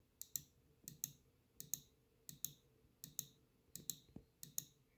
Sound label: Genesis Zircon XIII Kailh; Genesis Zircon XIII review